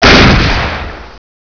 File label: kaboom